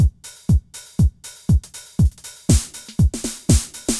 BEAT, DANCE DRUMS; MAGIX

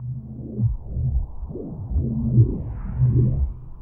BUBBLING; BEAT2000

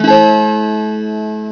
GUITAR

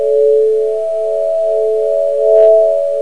FOURIER

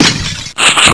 SHOTGUN; WINDOG10

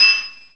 anvil; BEAT2000

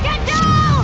alien; sci-fi sensation; aliens

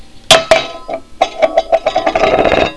DROP TIN

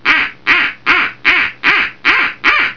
CALL

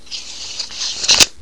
TAPE

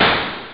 Level In; ClassicC64

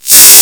FEEDBACK; cubase; ZXFX

